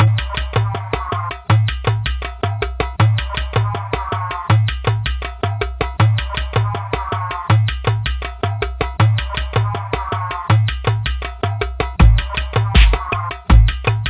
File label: Electro Trailer Sound Track